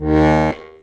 STEIRISCHE, D#1

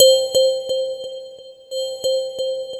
C4, Synth Line; 90 s Sample CDs; NiceBeats; SynthLine VOL-2

